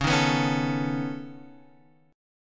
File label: C sharp 7th Suspended 2nd Sharp 5th C sharp down